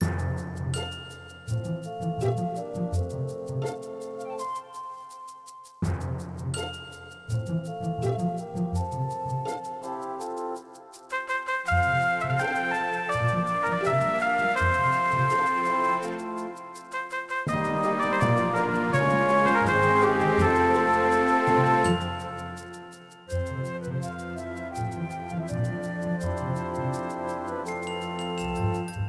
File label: Musicloop; Pokémon Masters Arena